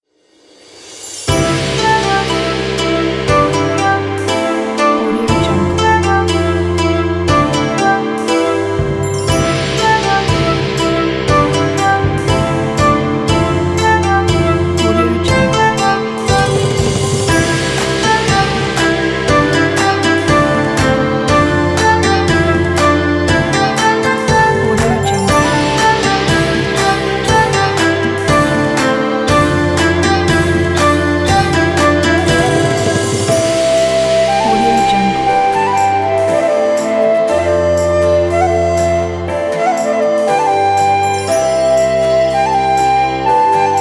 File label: chinese new year